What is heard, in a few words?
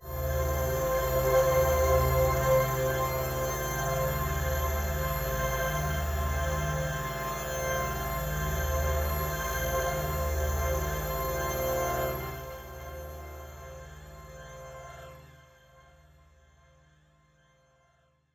One Shot Drone C, dystopian drone; One-Shot Drones